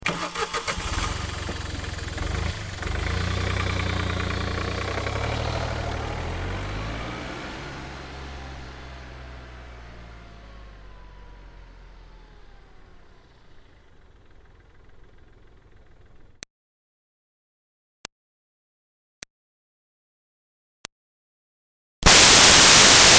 Car Smart Car Start Away Stop; 2005 Smartcar Fortwo; Vehicles

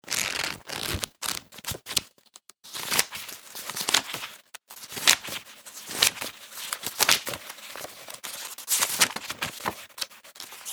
Rapidly turning the pages of a book.; Books; Offices; Book Search

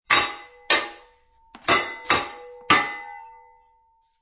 Swords Clang, Two swords clang during sword fight; Combat

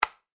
household switch light flick; Light Switch ( Wall Mounted ) Single Click; McKinney Sound

